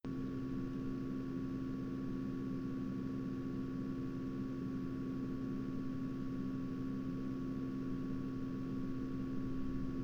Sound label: 1000 RPM